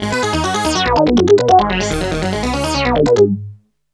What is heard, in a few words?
ACID LINE; AKAI S6000 CD-ROM - Volume 1